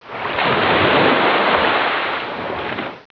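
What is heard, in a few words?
BIG SPLASH; sound effect; Klappen en Vallen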